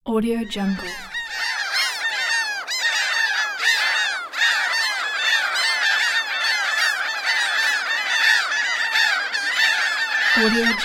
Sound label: seagulls